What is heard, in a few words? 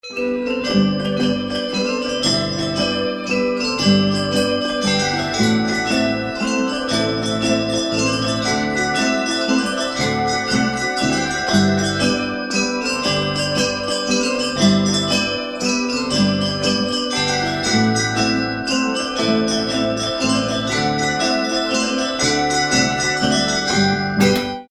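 Mazurca, campane; Grumello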